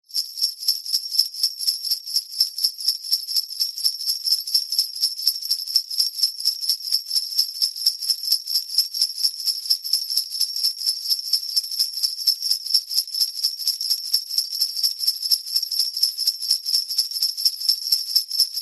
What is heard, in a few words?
musical sleigh bells med shake